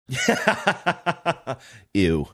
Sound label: laughing saying eww